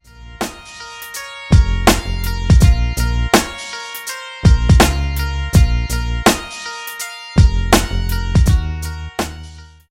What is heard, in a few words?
beat Start